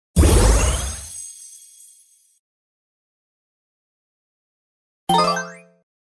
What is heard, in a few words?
lucky block open